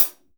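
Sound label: hi hat; Best Service Gigapack 2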